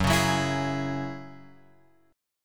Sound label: F sharp 7th Suspended 2nd; F#7sus2 Chord {2 4 4 X 5 4} strummed